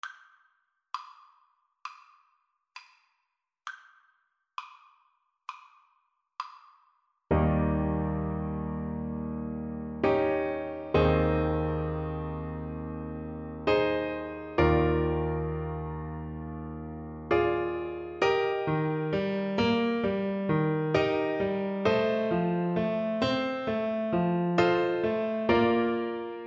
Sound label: Oboe